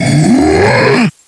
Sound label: Clot Death